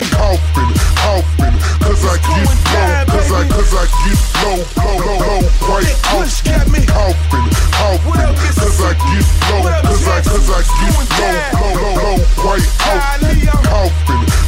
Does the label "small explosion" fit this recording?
No